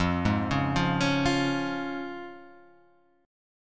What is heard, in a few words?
F Minor Sharp 5th, F; x,x,3,1,1,0,3,2